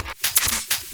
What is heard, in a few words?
chip LOOP hihat